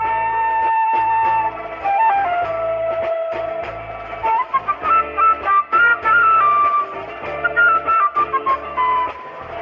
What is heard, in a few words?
flute, Jazzmatazz loops